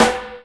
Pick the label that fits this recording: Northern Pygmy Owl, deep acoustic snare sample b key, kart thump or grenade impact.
deep acoustic snare sample b key